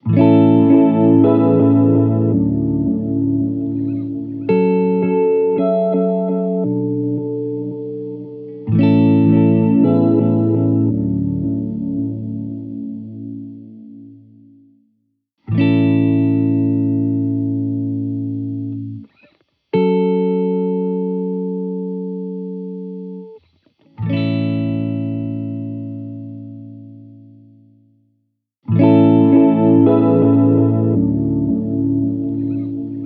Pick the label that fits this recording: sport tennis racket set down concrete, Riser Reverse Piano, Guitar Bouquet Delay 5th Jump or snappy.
Guitar Bouquet Delay 5th Jump